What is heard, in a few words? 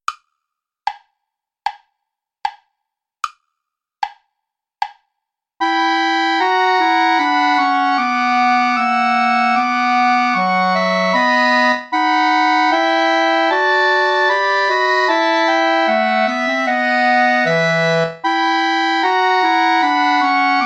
play along without clarinet